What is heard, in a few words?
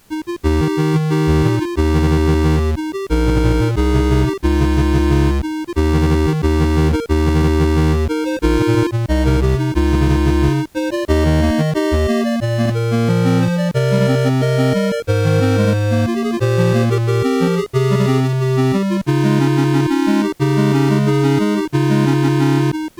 Settings; Atari Lynx; Warbirds; Atari Lynx audio rips; Zendocon's rips